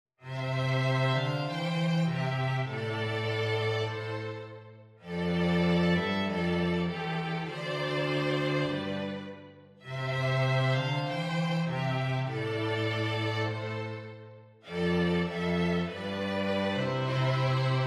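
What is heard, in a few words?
In the Bleak Midwinter violin violin cello; Christmas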